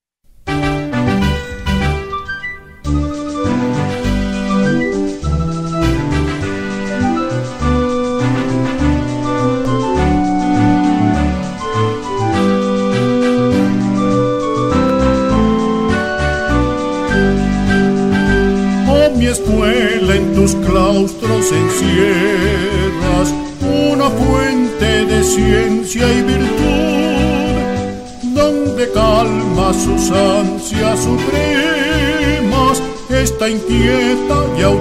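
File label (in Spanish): himno de la institución educativa escuela normal superior de saboya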